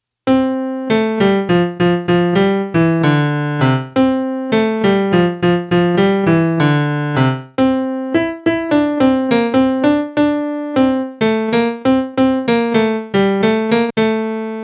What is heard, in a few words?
melody